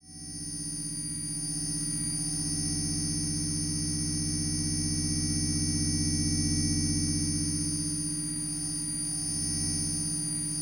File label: Kyma Ambiences; USO